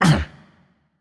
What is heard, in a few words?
grunt jump; smite; anubis